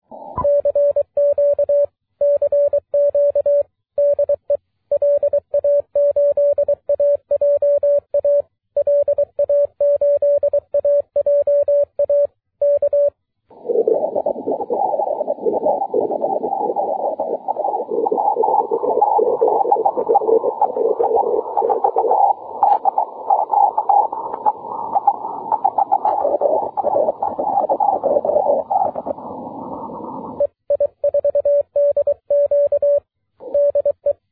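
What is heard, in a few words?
6m continuous wave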